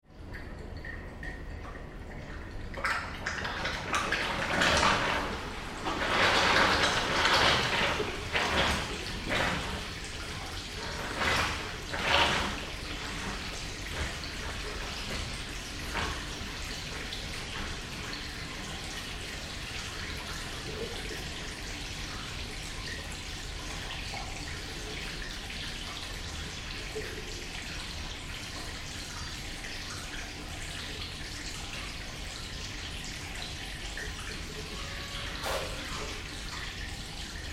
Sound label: Toilet Atmosphere in Restaurant or Bar; System flushing - drips - air conditioner background; Tom Hutchings